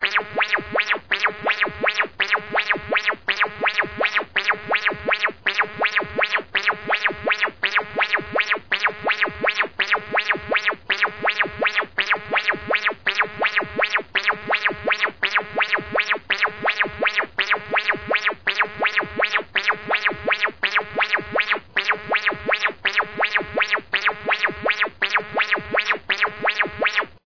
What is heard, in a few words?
Syncopated Laser